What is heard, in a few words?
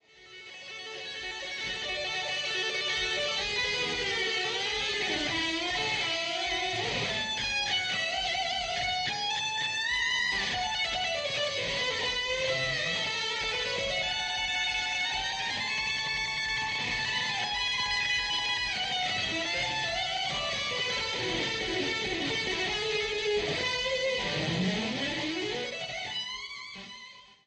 isolated guitar track solo